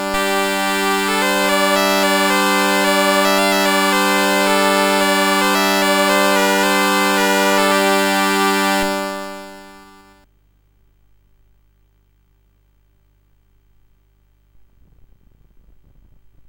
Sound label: sid CSG8580R5